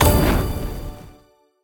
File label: Respin Add Symbol; aloha